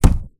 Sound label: orange hit hard; 磕头